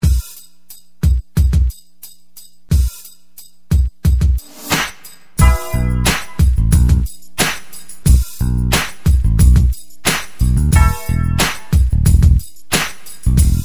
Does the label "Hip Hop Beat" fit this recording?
Yes